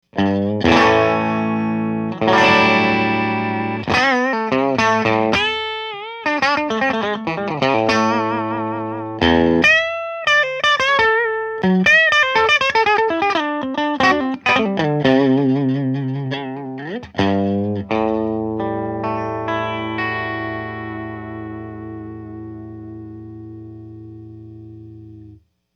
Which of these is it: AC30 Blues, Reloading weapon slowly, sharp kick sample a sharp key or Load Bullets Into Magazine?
AC30 Blues